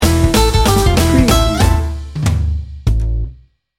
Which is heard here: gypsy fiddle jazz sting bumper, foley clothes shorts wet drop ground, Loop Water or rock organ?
gypsy fiddle jazz sting bumper